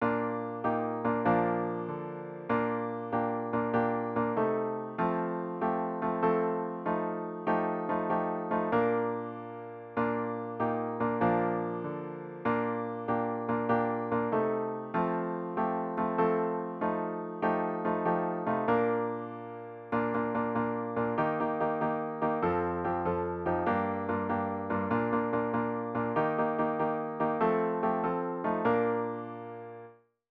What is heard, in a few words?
midi